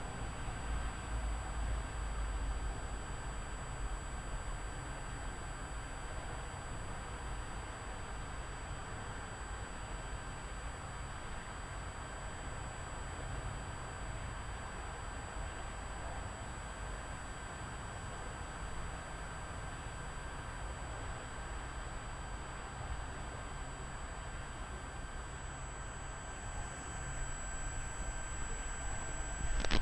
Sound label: bridge beep